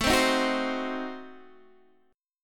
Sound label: A Diminished 7th A down Guitar Open D